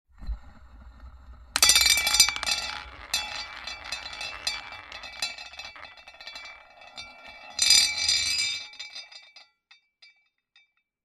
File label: The roulette wheel spins; board game casino mahjong